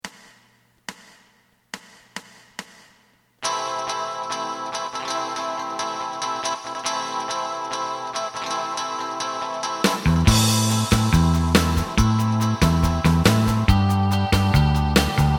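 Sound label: lead guitar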